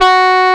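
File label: horn G3, synthesizer analog horn; AKAI S6000 CD-ROM - Volume 1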